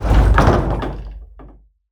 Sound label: elevator Stop